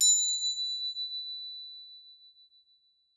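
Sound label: Crotale High; Roland SPD-S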